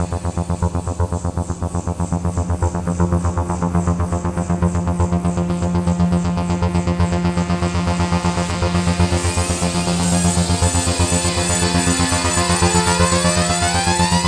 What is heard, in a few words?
philter (sweep) long; Sonic Foundry (Sony Creative Software); Ugly Remnants VOL-2